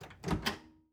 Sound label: Household Microwave Open Door